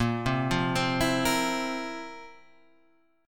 Bb Suspended 2nd Sharp 11th, chord; Bbsus2#11; arpeggiated; JGuitar

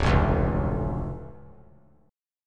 D Minor Major 7th, D; DmM7 strummed; JGuitar

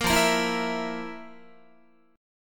F Major 7th Suspended 4th F, Guitar; strummed

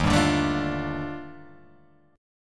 D sharp Major Sharp 11th, Guitar, Open G

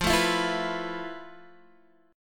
F Major 7th Flat 5th, F, down, Guitar, DADGAD

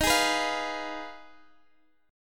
F Minor 7th Flat 5th, D sharp

